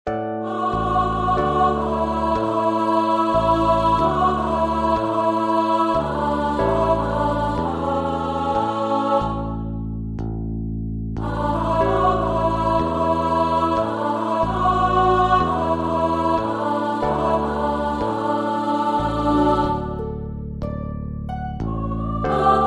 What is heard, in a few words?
alto